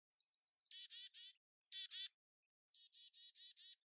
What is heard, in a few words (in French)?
chant